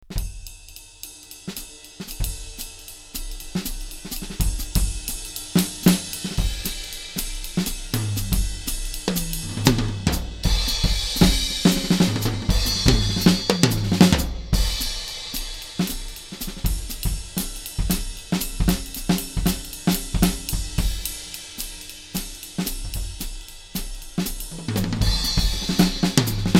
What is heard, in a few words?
full kit